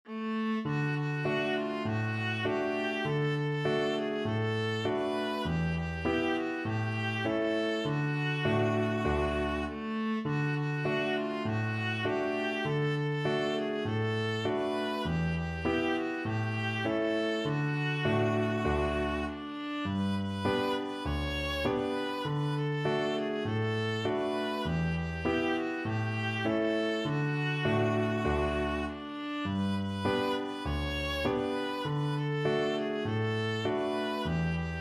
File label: de laredo, viola